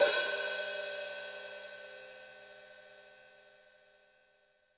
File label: HiHat & Crash, Roland TD-20; HiHats